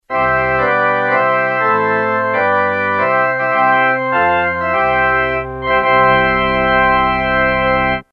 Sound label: organ